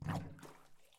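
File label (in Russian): вода поток движение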